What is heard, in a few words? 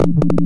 scanner scan; scmod